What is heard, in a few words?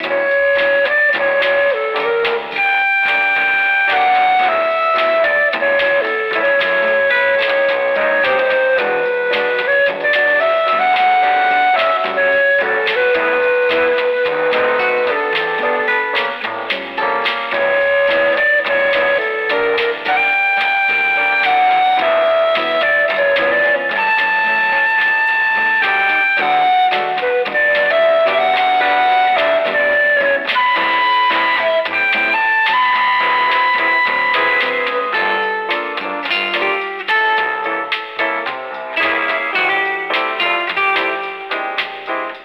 hotel bossa